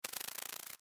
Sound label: Insect Bug Beetle, Insect Bug Beetle Wings Shake Buzz; Insects; Insect Bug Beetle Wings Shake Buzz Short; Insect Sound Effects; Animal